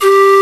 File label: Pan Flute G3; Proteus 2000; Digital Sound Factory Proteus 2000